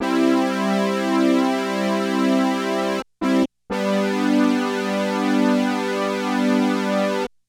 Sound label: JX3P Hard Synth Brass